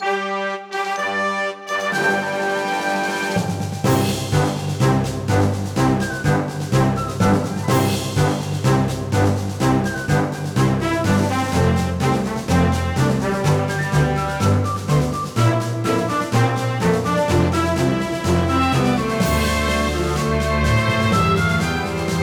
B Menu Event

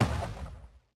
Bear Step

